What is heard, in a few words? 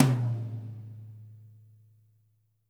TOM, WET WHACKER; Spectrasonics; Burning Grooves CD2